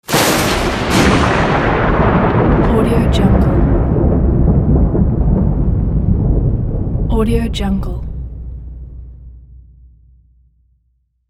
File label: lightning strike